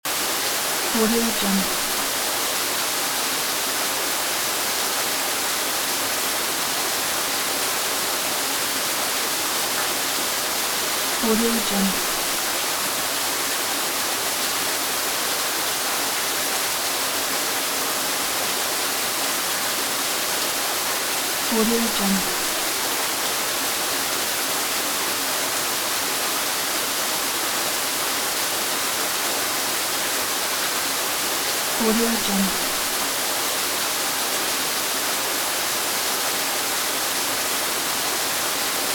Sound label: waterfall small